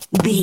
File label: footstep concrete land